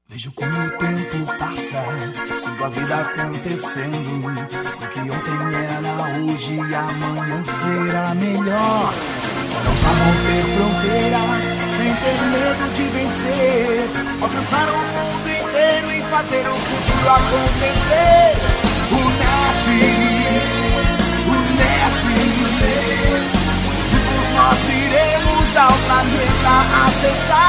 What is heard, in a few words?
jingle; unerj